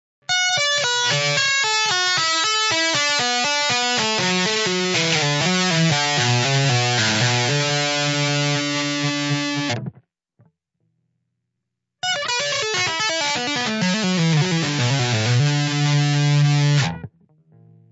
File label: Tap, guitar